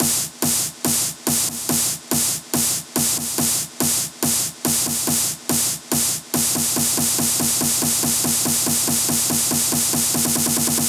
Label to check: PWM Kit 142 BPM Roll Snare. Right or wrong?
Right